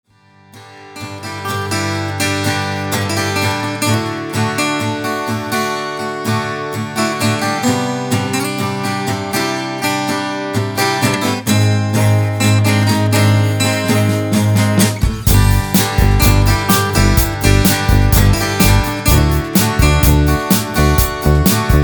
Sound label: GUITAR PIS